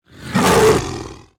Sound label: Attack